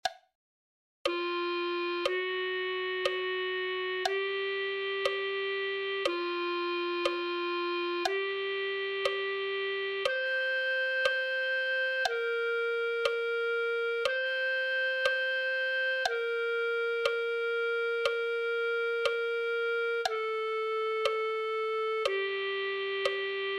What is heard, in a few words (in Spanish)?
clarinete